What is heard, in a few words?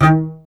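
string contrabass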